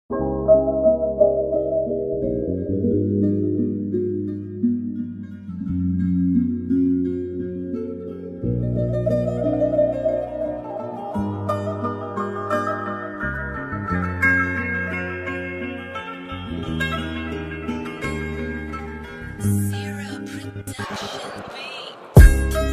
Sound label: Tukish Saz